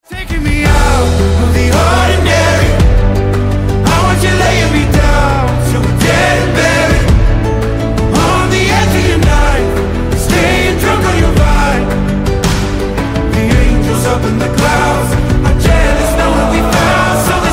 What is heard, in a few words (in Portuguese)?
musica